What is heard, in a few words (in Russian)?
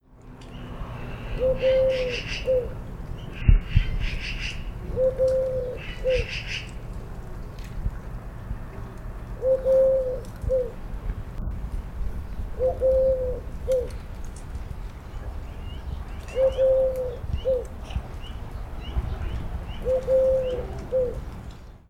kolchataya gorlica golos